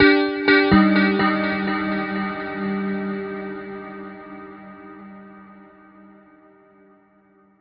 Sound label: bell echoes, synth and electronic loops